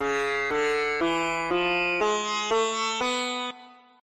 C-Sharp Indian Phrygian Dim 4th Scale, Virtual Sitar; Syntheway